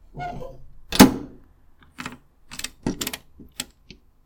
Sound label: mail box